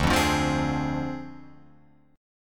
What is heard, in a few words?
D Minor 7th Flat 5th, Guitar Open F; Dm7b5; strummed